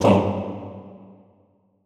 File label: TONG G1, Classical Choir; Best Service; Extended Classical Choir; SHORT TONG